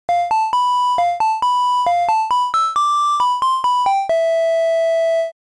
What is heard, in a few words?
Ocarina “”, 8bit; 8bit of Zelda -Ocarina of Time